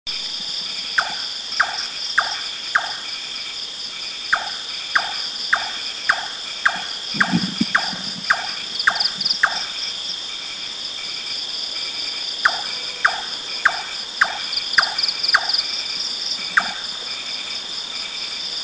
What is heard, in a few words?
Large-tailed Nightjar, south Thailand